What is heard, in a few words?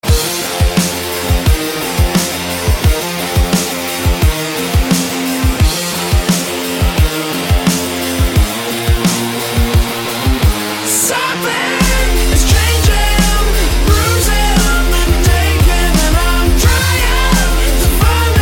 win music